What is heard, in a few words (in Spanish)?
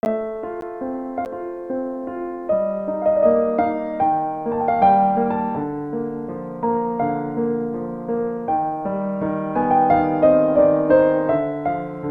portada musica